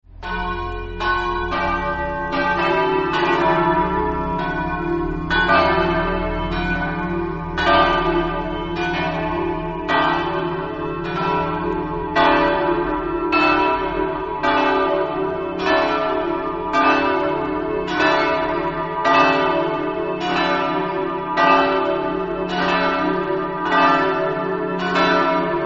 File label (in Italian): campane; madonna